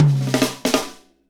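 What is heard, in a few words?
beats funk, Roland; Northstar - Drumscapes Roland; Uptempo Funk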